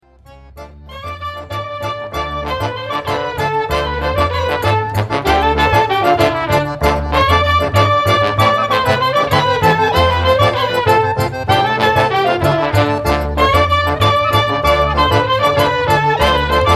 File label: GROOVE, KLEZMER BAND